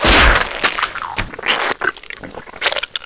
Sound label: SPLAT